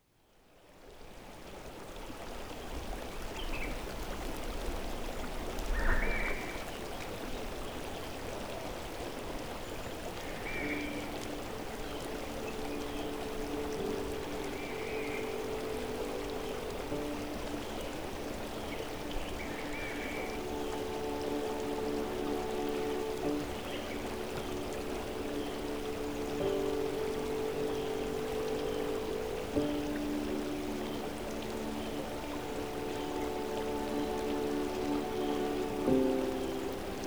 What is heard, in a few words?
Stream Relax